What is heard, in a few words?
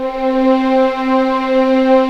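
C4A legato, violins; East West; The Ultimate String Collection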